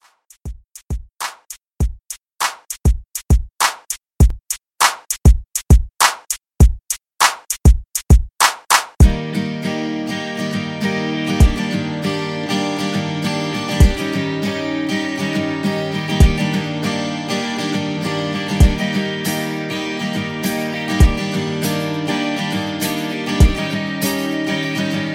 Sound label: 90s Pop Redrum; Remixfreakz